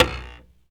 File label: RAIL BUZZ, percussive; Rarefaction; Poke in The Ear With A Sharp Stick